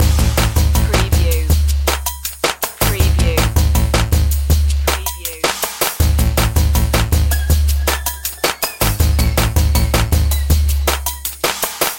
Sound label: eastern pulses loop